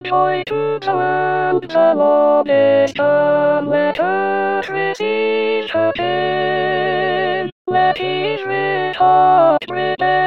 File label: ALTO; Joy To The World